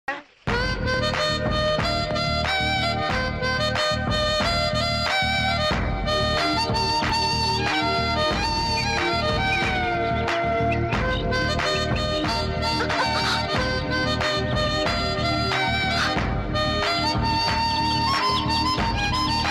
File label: movie bgm telugu; narasimha movie